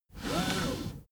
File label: car seatbelt pull